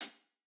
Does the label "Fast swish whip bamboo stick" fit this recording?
No